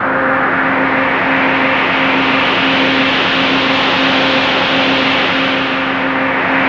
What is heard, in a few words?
wind wave, synth waves; Zero-G Ambient 1